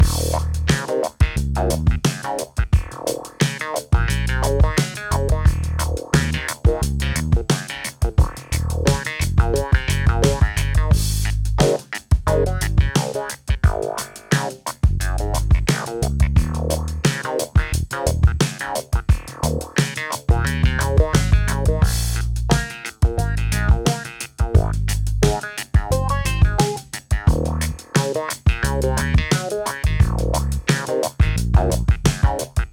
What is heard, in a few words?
clavi; funkastic; sampleson